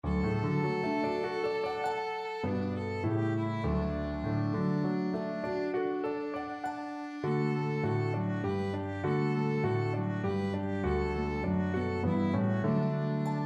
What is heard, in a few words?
Trio Violin; Come Shepherds Rise